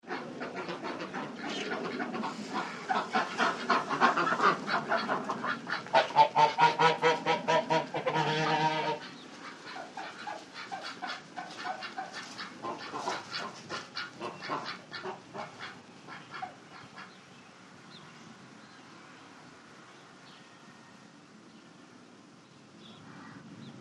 Sml Grp Ducks Goose

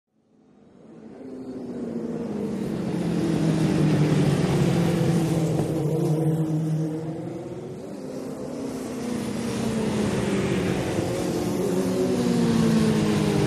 Motorcycle Road Racing

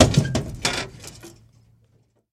Metal Impact Sml

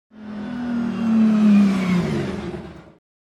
Sports Car CU In Pit

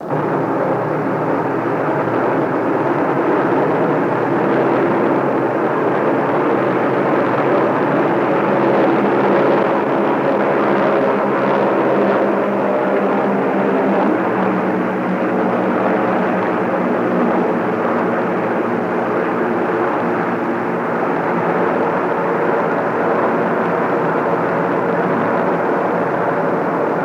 B29 Bomber Grp By Fade, superfortress; B-29 Bomber Group By, Fade At End

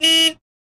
Corolla 83 Horn Small, horns

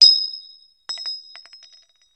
Bullet Shell Drop On, shell casings fall

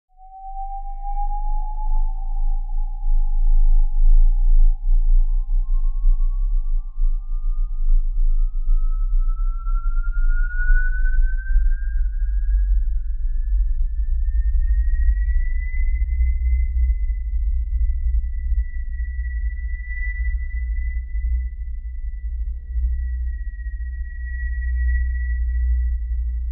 Bed Ghostly Howls Low

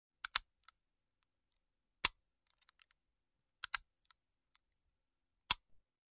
45 Colt Dry Fire Serie; foley gun movement

